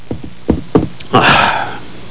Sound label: sigh